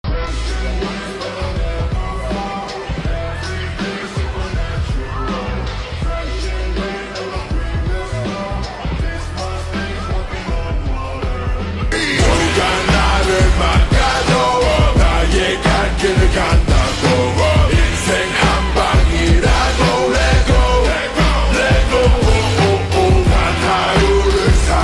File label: Ringtone Slowed Reverb